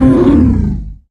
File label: giant hurt; sven; russian sounds